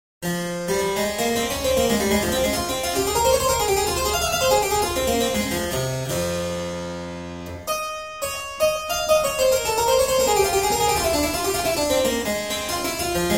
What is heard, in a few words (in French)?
toccata clavecin